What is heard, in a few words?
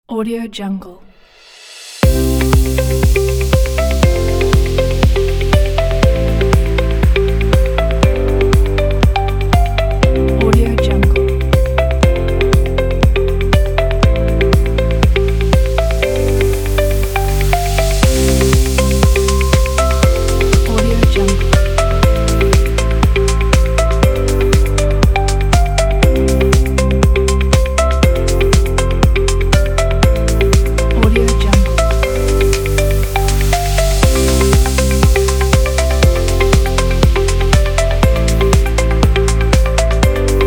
blockchain music